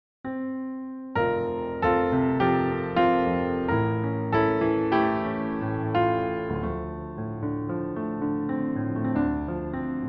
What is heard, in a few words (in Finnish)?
pianosoolo